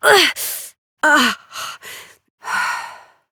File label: Death, Adult; New War; Operator